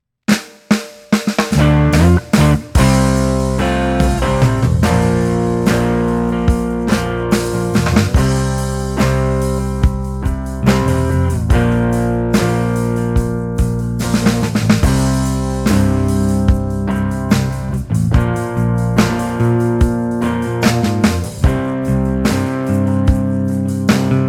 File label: solo